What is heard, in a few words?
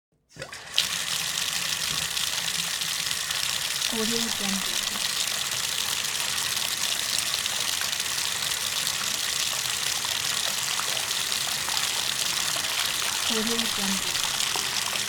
bathtub faucet